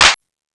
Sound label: CLAP M1, DRUMS; East West; Global Sounds